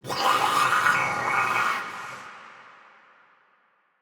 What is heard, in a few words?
Death, Barks, Battle, Soldier; Mendicant